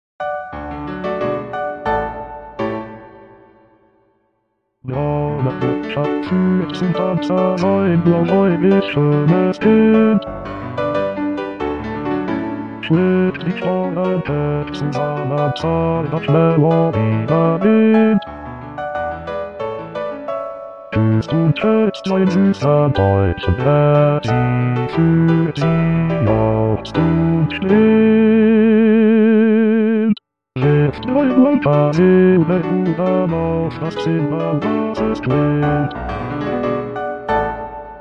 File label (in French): bas paroles